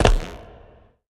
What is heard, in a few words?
Death Bodyfall; smite; god cupid skin13